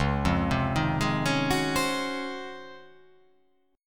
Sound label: C sharp Major 11th, chord